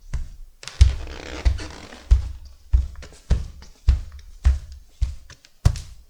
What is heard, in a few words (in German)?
Schritte Wohnung Innen Socken